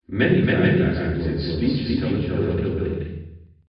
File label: multiple delay